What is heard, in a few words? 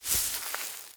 zone bush step